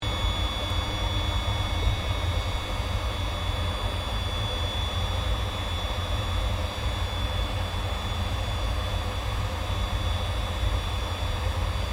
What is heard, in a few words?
AIRCRAFT CA-27 SABRE IDLE INTERIOR; CA-27/F-86 Sabre; Airplanes; Vehicles